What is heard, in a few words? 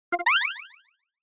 Accent